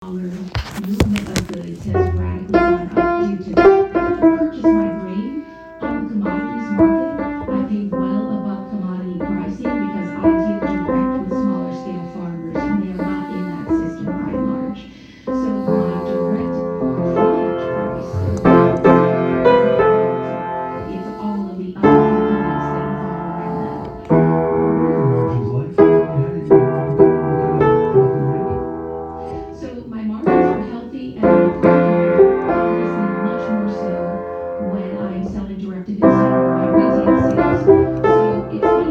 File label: piano